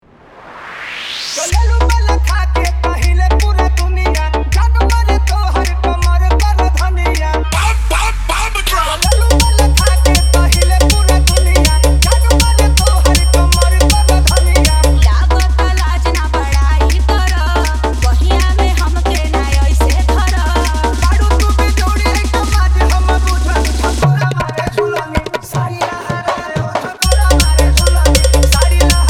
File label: Bhojpuri Dj Remix Songs Edm Mix